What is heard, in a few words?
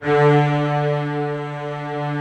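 string, orchestral strings; Greytsounds VOL-1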